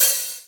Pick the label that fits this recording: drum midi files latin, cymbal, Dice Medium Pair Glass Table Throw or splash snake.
cymbal